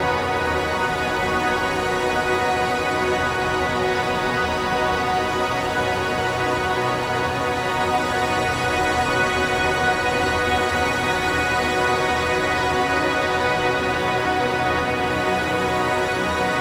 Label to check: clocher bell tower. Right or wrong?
Wrong